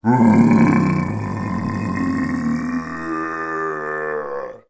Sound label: horror monster zombie male groan; sound effects one